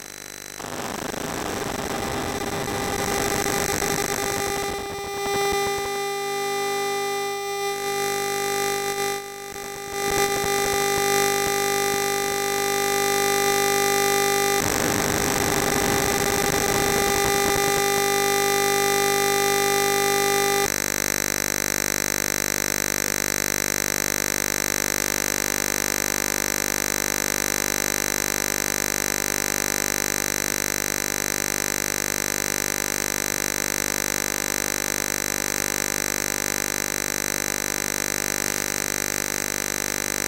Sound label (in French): Transfert de donnees 5G NR. Transmission a 36 GHz; Ondes électromagnétiques et électromagnétisme